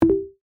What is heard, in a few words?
click select; powerball evo